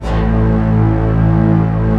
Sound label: fast strings, Fast & Slow; Optical Media International; Sonic Images Library